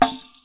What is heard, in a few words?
arabic perc, Arabic Percussion Kit